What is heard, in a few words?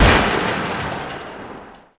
van crash garage; Max Payne